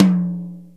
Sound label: HI TOM, drum